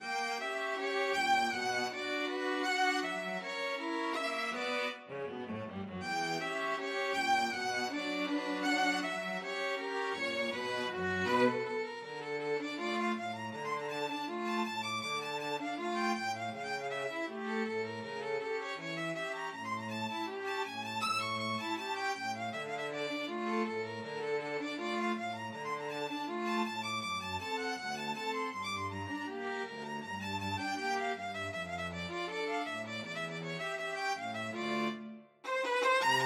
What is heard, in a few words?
el choclo, string quartet